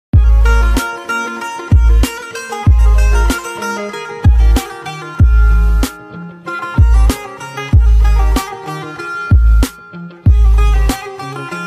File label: turkish saz